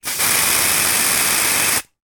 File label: household womans deodorant aerosol can spray